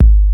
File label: PUNCHIN, 12-Bit Drums; E-MU Formula 4000 Series Vol. 1; Hip Hop Nation